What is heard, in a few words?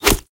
Fight Attack Bow Damage; Mighty Party